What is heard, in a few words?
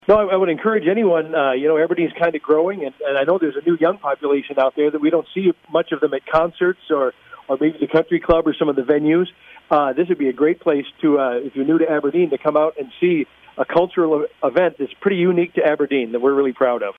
Jazz Swing Party